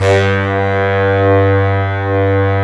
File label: sax appeal G2; Synth